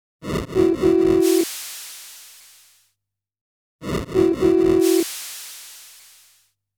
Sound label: Railroad, string quartet